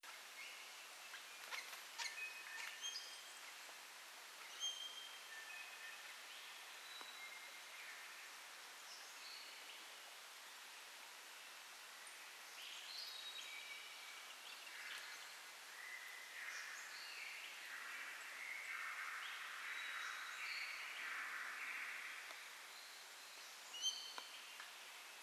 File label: more birds